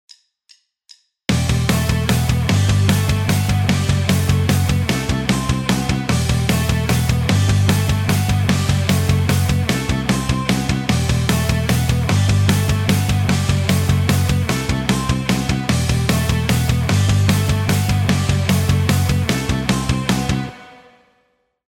backing, guitar; Rockschool; Guitar Techniques 2018 Issue 289 December

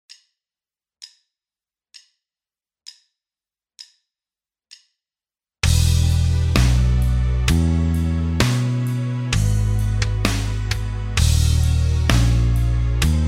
In The Woodshed backing, Guitar Techniques; Guitar Techniques 2017 Issue 275 November

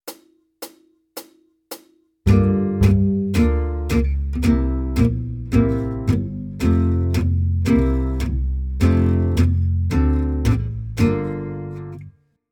Jazz, Guitar

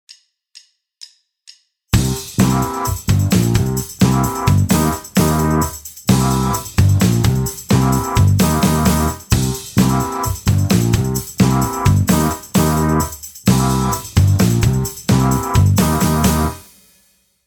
backing; Guitar Techniques 2018 Issue 288 November

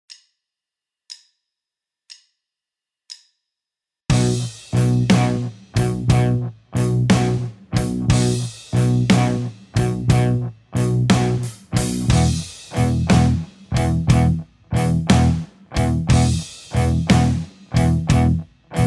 Rockschool backing; Guitar Techniques 2019 Issue 296 June

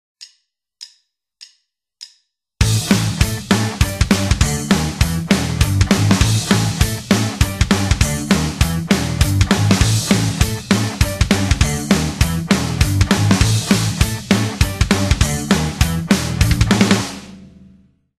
backing; Guitar Techniques 2017 Issue 266 March

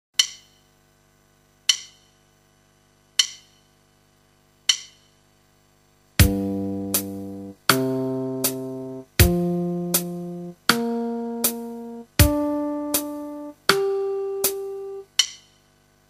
Chord Camp backing, Guitar; Guitar Techniques 2017 Issue 266 March

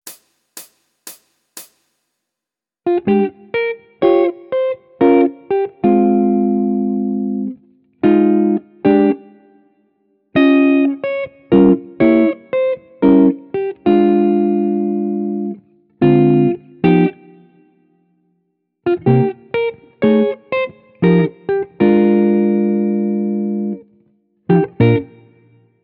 Jazz Chord Melody, Guitar; Guitar Techniques 2019 Issue 297 July

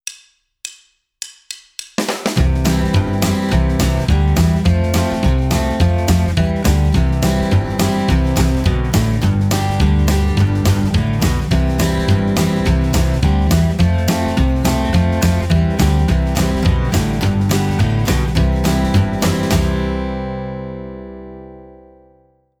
Rhythm Guitar; Guitar Techniques 2018 Issue 289 December

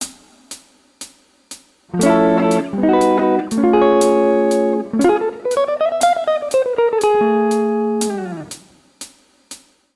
Lickbag, Guitar; Guitar Techniques 2019 Issue 290 January

